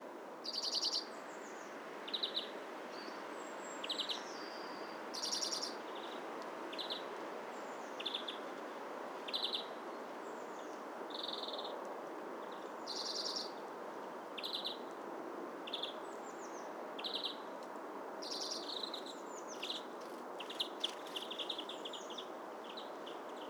Chloris chloris; SETE

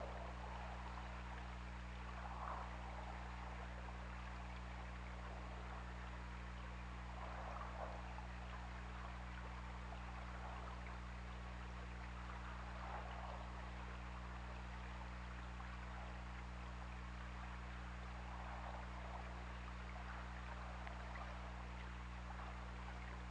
Orca; OrcaLab

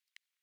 DRONES

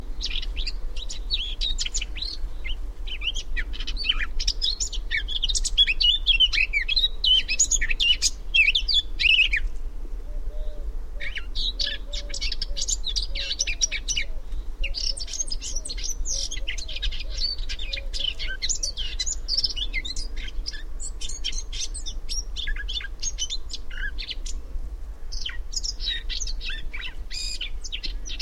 Sylvia atricapilla; SETE

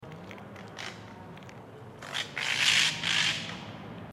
Garrulus glandarius; SETE

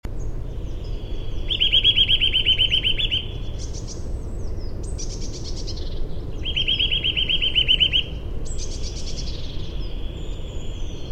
Sitta europaea; SETE

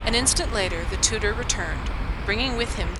noisy

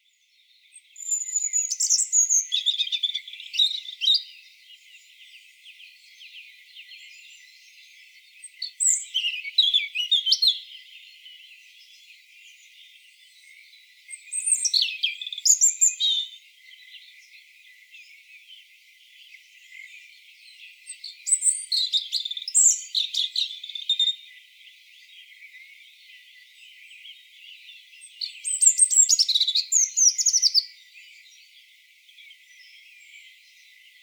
Erithacus rubecula; SETE

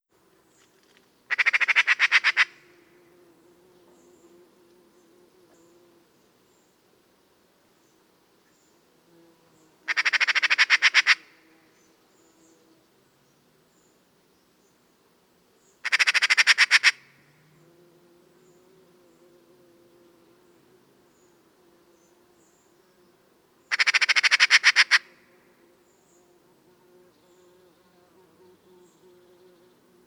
Pica pica, chant

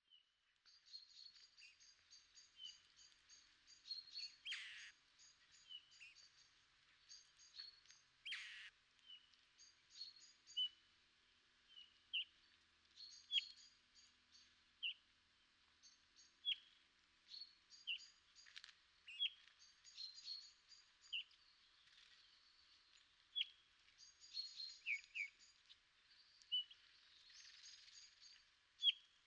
Pyrrhula pyrrhula; SETE

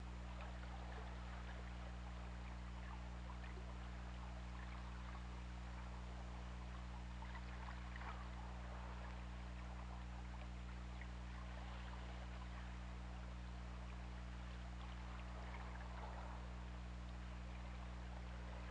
Orca; OrcaLab

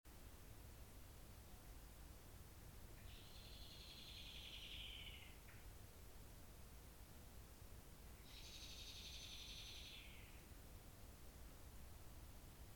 Turdus merula; SETE

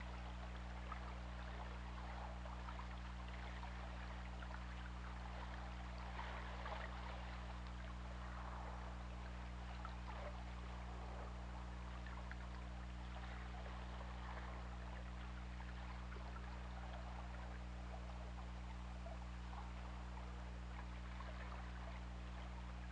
Orca; OrcaLab

